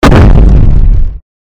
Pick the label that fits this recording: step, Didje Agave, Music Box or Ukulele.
step